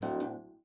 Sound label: STOP D1, harp; Xsample VOL-8; HARP+FX